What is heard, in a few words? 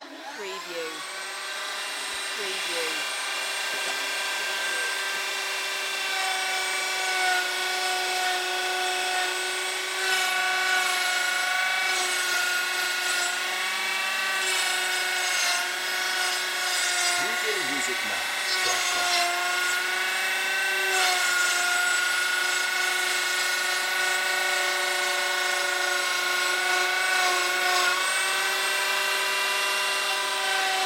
Power Plainer Run